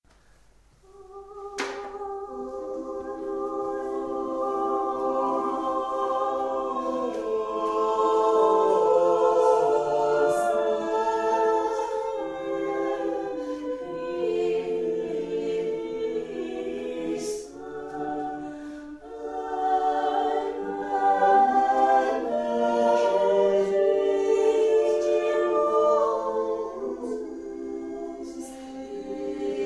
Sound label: Adoramus te Christe, a capella; Lassus